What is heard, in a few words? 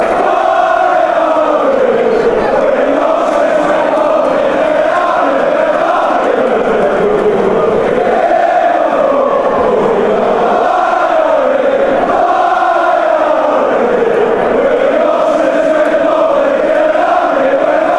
yorkshires republican army fanchants